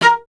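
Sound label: strings cello A#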